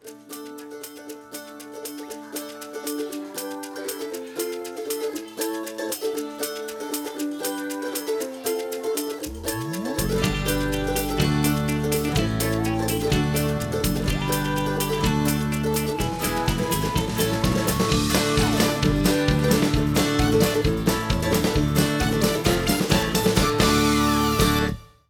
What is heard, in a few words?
Cupcakes (Instrumental); My Little Pony OST Repository; Season 1; Bonus Music